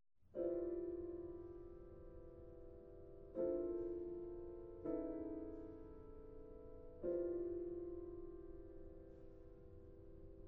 Etudes, Muted, Motionless, Piano; 20th Century American Piano Music

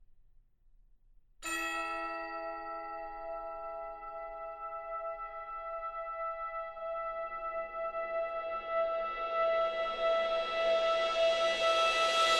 Wind Ensemble - Drum Ceremony and Fanfare

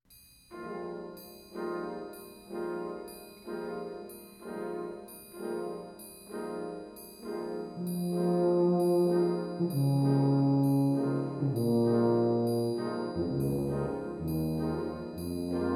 Prism, Shards Of Color for Brass and Percussion; Mvt. 1. Green

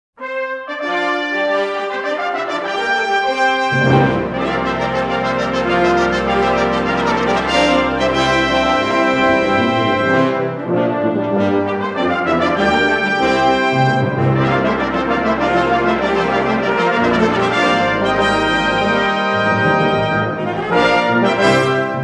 Fanfare & Theme, Brass Band

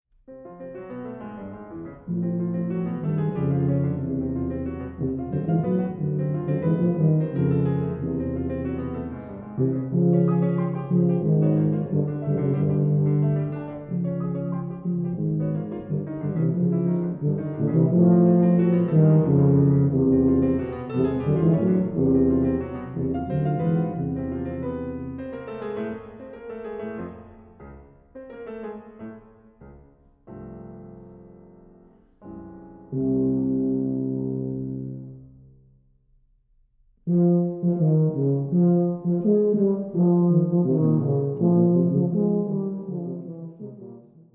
Variations For Two Tubas And Piano

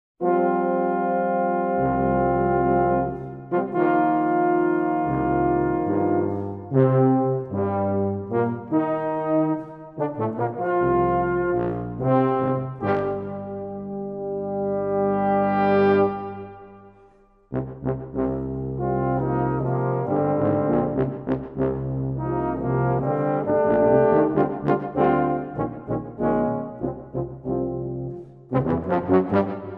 Fanfare

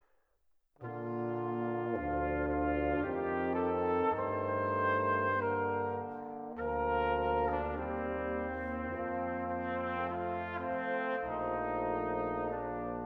String Quartet, Andante Espressivo; String Quartet No. 1 Op. 12

